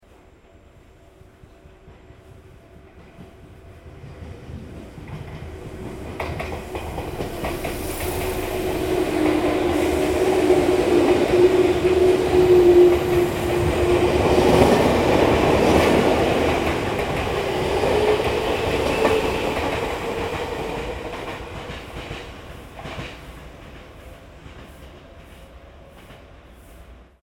train

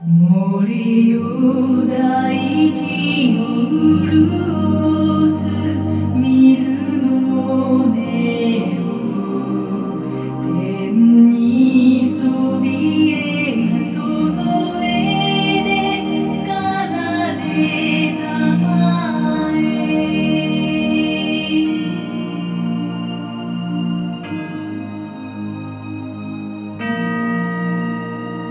music

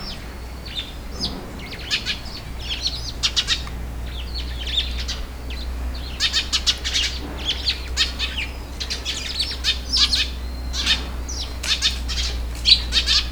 bird; zoom

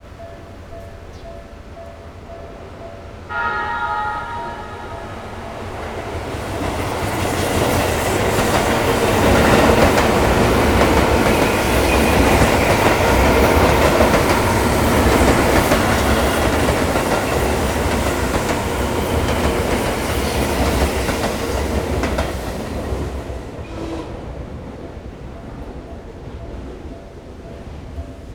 xy train

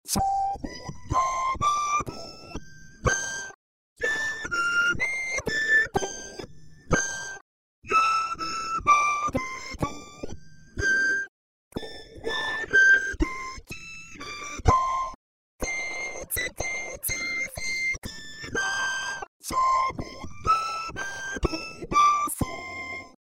Pitch Correct

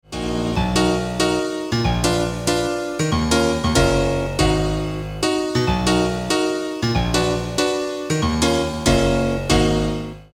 piano

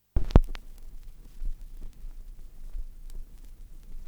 usb

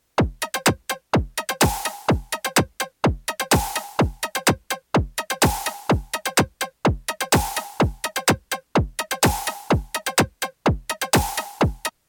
drums

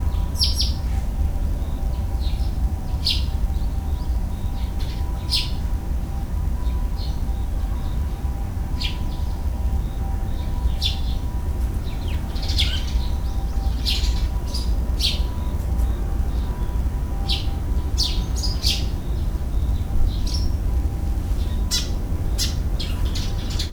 bird; 2mic

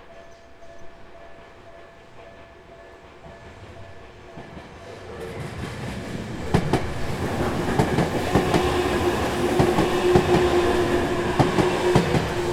train, XY

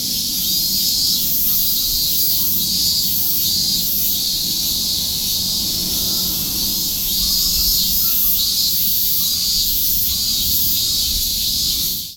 cicadas

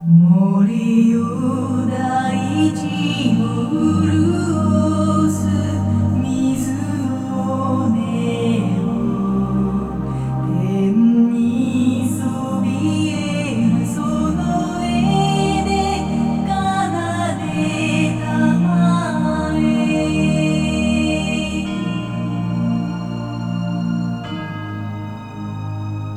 music 3mic

